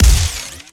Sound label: Sci Fi Shotgun Fire Heavy; GUNTech; AlientAttack.MonoGame